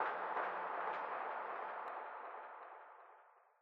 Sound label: Library Perc